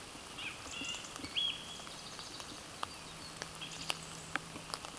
Haematopus ostralegus

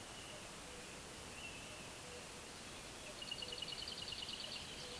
Sylvia curruca

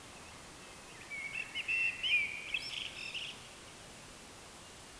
Turdus merula

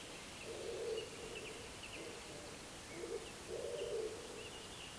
Columba palumbus

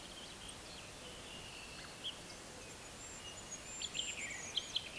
Regulus regulus

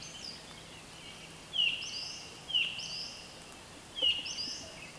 Pandion haliaetus

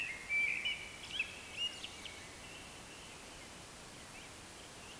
Turdus philomelos

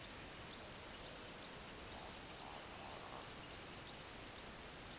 Scolopax rusticola

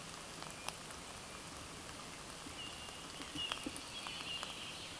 Pyrrhula pyrrhula

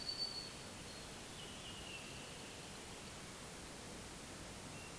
Turdus iliacus

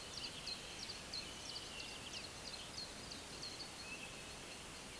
Phylloscopus collybita

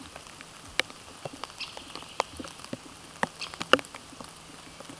Dendrocopos major

